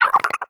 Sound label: idle chirp, headcrab, creatures; headcrab classic; vox